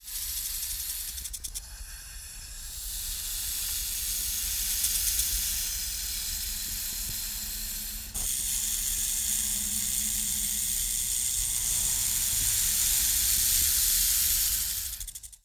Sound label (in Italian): serpente; Inquisitor 3